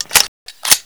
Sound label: Inf Weapons Foley 3rd; Red Orchestra 41-45